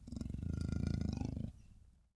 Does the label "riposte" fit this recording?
No